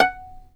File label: fiddle pizz F#5 R; Sampleheads; Whole Lotta Country 1 & 2